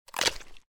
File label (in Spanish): mordiscos